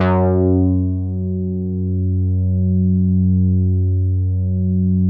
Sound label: MEMORYMOOG F#2; Big Fish Audio - First Call Vintage Keyboards